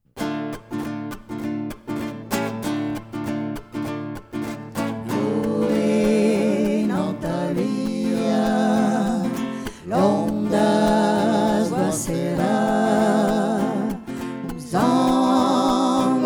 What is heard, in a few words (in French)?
Campanero 3 voix